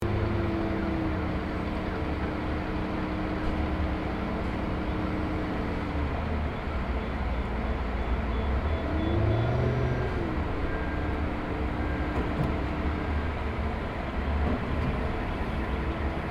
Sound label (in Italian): Cantiere